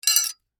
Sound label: Sai Put Together, Handle, Metal; Warfare; Sword Sound Effects; Swords (Sound Effects:Combat; BlastWave